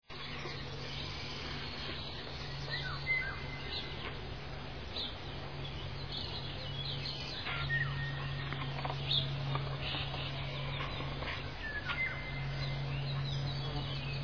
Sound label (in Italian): uccello